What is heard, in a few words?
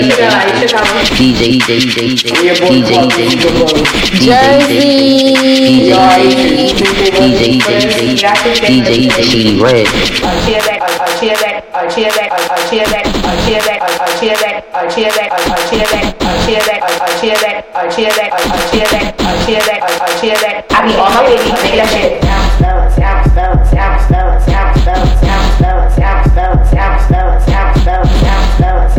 Party Song